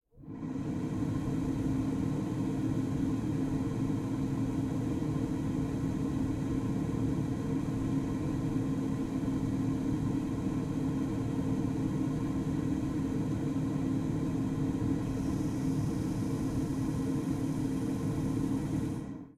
CAR Ventilation, Switch On Idle Off, Speed 03, Nissan Note, EXT; Japanese Compact Car; Nissan Versa Note; Articulated Sounds